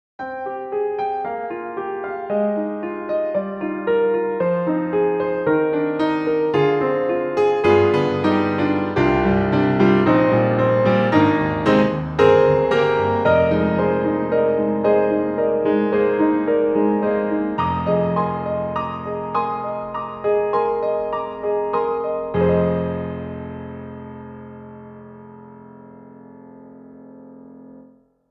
Pianos, Steinway D; EastWest; Quantum Leap

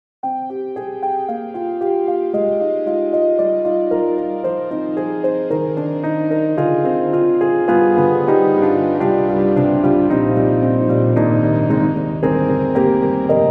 Light Saws; Steinberg; HALion 7; Verve

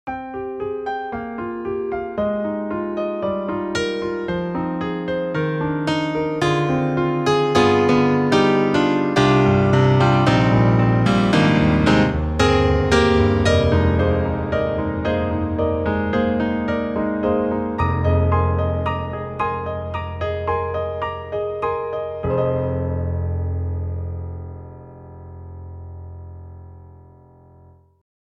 Roland Juno-Gi 4 Layer Pop Piano

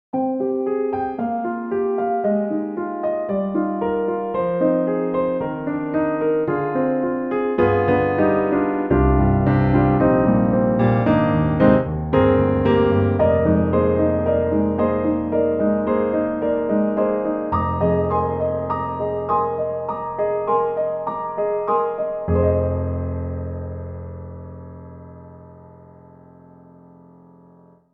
Cine Piano, Intimate; Cinesamples

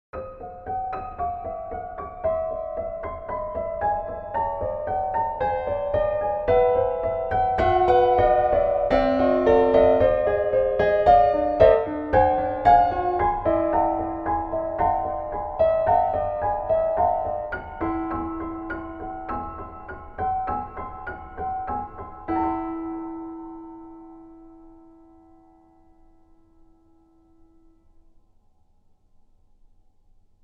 CFX CONCERT GRAND; GARRITAN; Wendyhouse

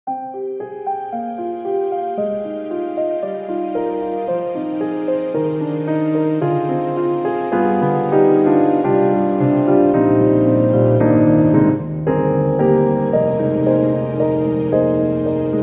Surrounded by Strings; Steinberg HALion 7; Verve

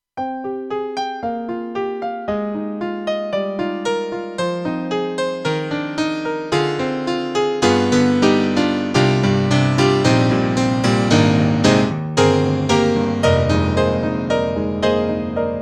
Roland JV-2080 Bright Piano

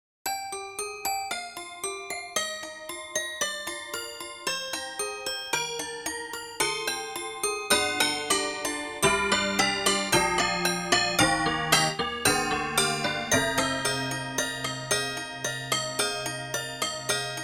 Toy Piano; IK Multimedia; Sonik Synth 2

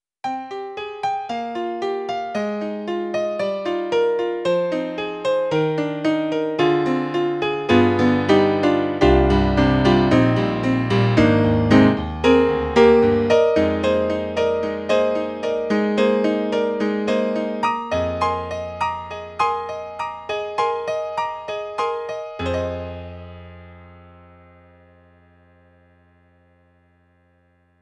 Bright Grand; E-MU Sounds of the ZR Expansion ROM

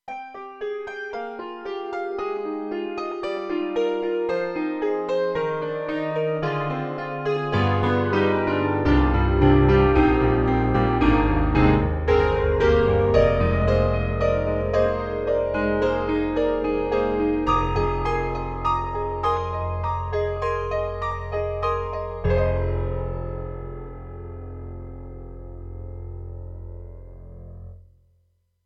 piano and synth pal, TRITON Rack; KORG EXB-PCM08

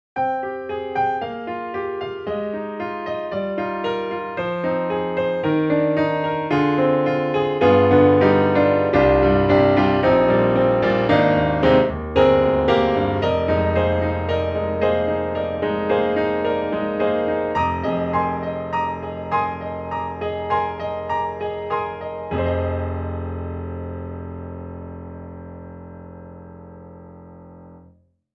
Studio Grand, Ambient; XLN Audio; Addictive Keys

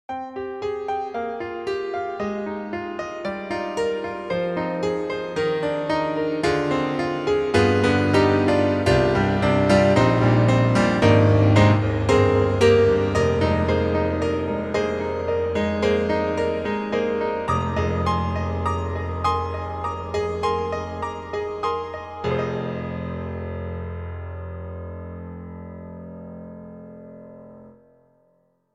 Imperial Grand; IK Multimedia; SampleTank3; The Koeln Piano

